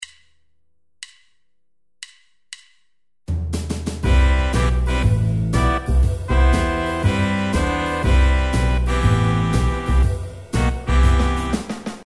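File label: percussion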